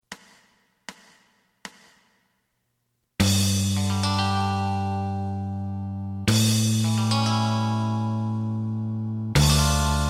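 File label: lead guitar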